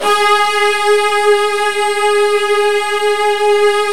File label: Virtuoso Strings